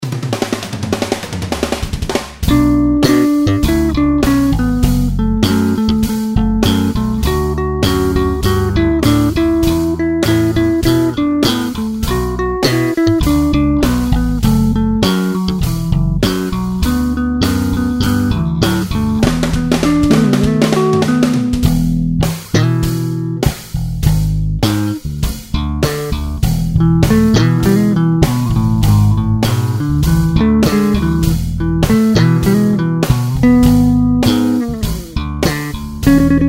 groove bass

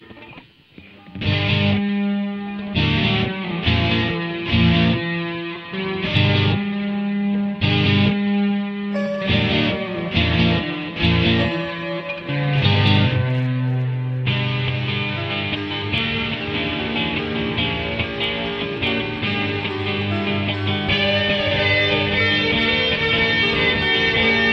instrumental

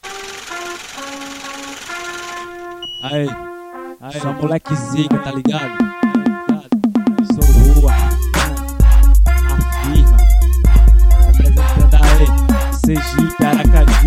trap beat

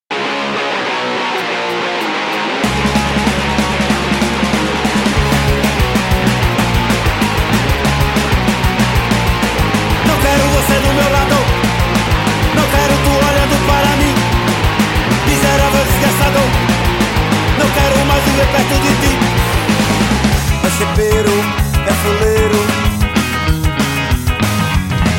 punk ska

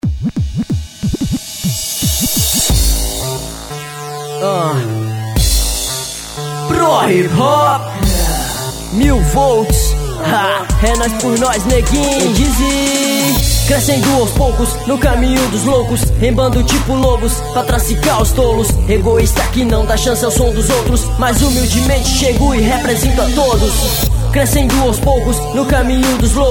hip hop remix

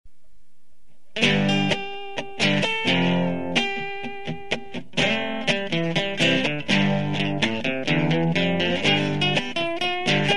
rock

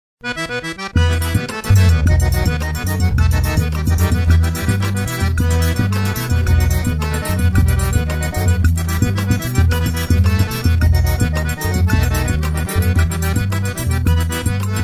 trio

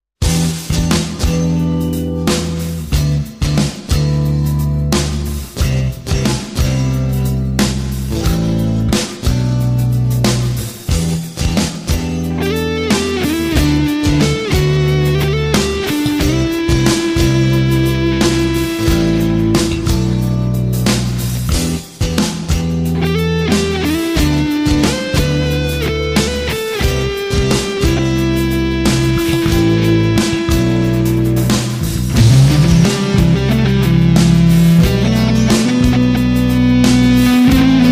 guitar miraculous rain

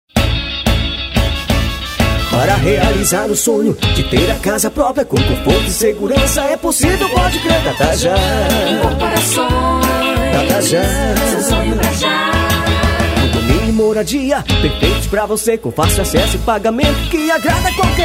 jingle; data ja incorporacoes

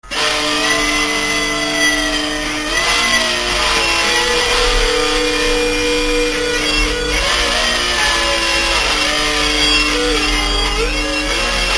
instrumental

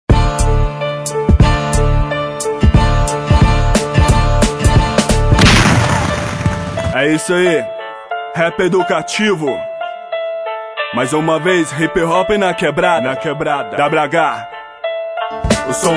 hip hop

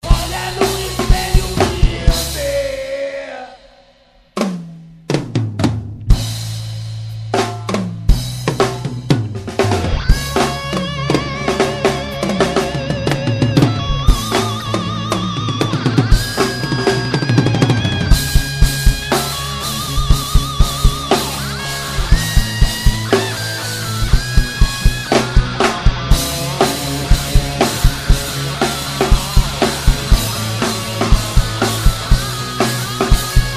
cut drum track

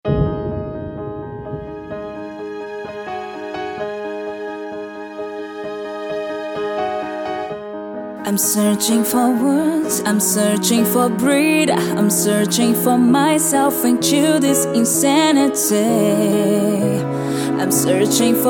piano acustico

